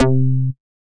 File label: Miss Sound